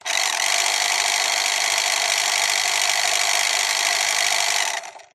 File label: motor small coarse rev short then steady rattle toy car rc; skyclad sound